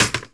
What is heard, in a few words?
bad part stone, droids robots